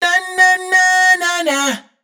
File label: riff Na Na Na 126 Db wet, Vocal Sample Pack, Raw Vocals, Wet Vocals; Slate Digital Omnivox Vocal Sample Pack